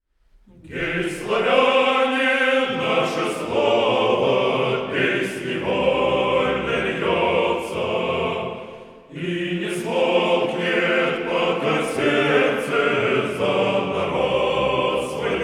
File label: acapella